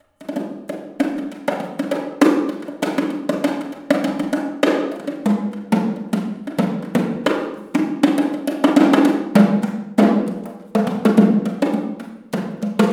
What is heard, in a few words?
BONGO